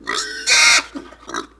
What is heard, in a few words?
Pig Squeal; Civ4 warlords; Resouces and Improvements